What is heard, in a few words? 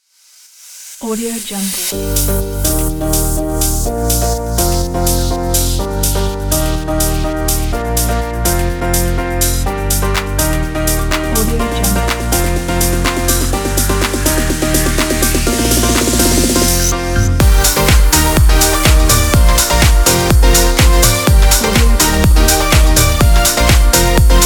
summer fun pop